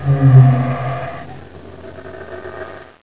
ichy die

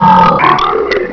bullchicken attack growl

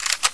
ak47 bolt pull, weapons

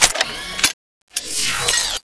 ethereal reload, weapons

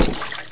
launcher bounce, weapons

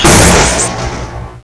gauss, weapons

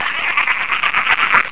slave die

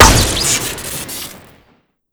shoot crit

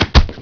body drop

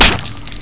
bullchicken spit hit

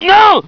pain, scientist

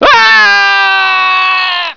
scream, scientist

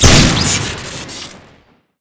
extra crit, weapons

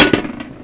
grenade hit; weapons

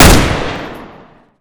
stens shoot, weapons

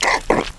grapple fire, weapons

